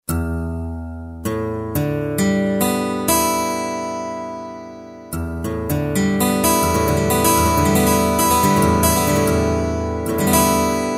Steel Str Guitar, Kurzweil K2000; Kurzweil K2000 V3

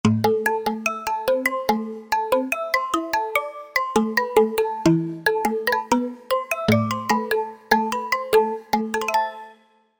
Seq Mallet

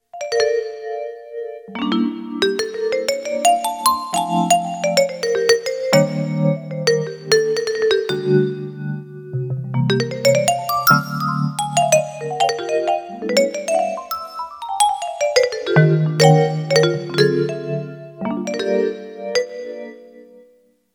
Jazz Vibes; Yamaha PSR-550; Voice

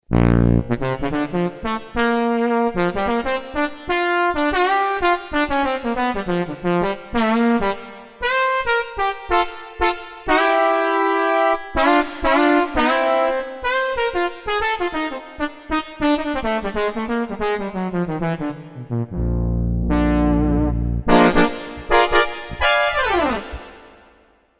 BR FM Bite, Yamaha TG77; Factory Presets

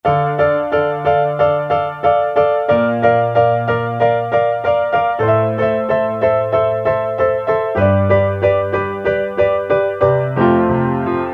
Piano, Casio CT-370; Tones

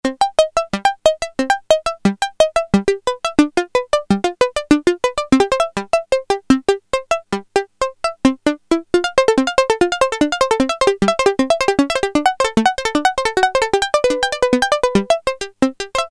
Banjo, Prophet-5; Sequential Circuits Prophet-5 (Ver.3); Factory Patch; File 3 Programs

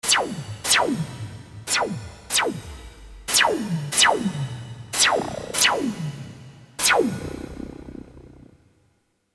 NOISE, Latin Percussion

